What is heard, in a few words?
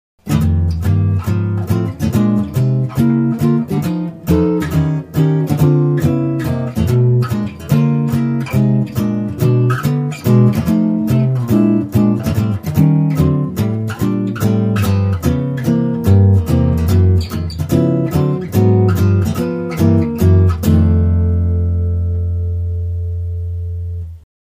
blues comp, guitars